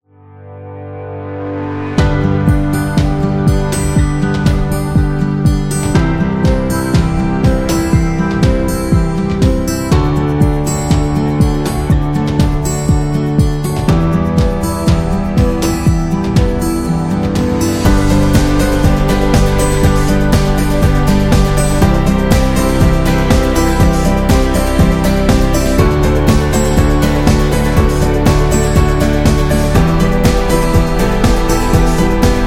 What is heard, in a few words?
The Commercial, corporate music; corporate music vol 11